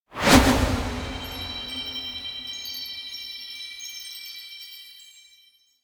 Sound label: rift open; anemoi